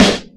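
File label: snare, Boss DR-202; Drum Machines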